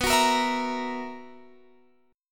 B Major 7th Suspended 4th B down Guitar Open F; strummed

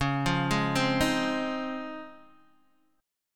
Db Major 9th, Guitar, DADGAD

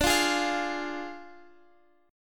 D Diminished D, Guitar, Open F; Ddim strummed; x,x,14,12,11,12

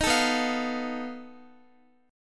B 7th Flat 5th B Guitar; B7b5; strummed

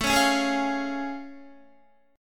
A Augmented Major 7th A Guitar; strummed

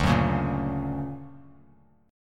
D Augmented Major 7th down Bass Standard; D+M7 strummed; JGuitar

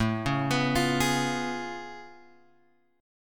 A Augmented Major 9th A down Guitar Open F; arpeggiated

